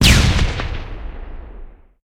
remote time bomb explode; Original TK Sounds

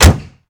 door close; Golden Crayon Sounds

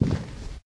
plexi step; Golden Crayon Sounds

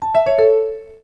exit, NVDA Sounds; waves

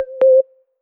Call Reconnected; Skype Sounds

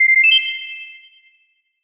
team accept; Original TK Sounds

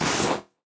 wall couch; Golden Crayon Sounds

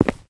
concrete step; Golden Crayon Sounds

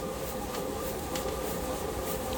stationary bike; Golden Crayon Sounds

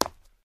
concrete step; Golden Crayon Sounds

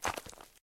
gravel step; Golden Crayon Sounds

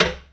steel step; Golden Crayon Sounds

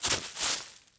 leaves land; Golden Crayon Sounds

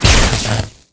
wire bomb hit; Original TK Sounds

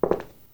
stone land; Golden Crayon Sounds

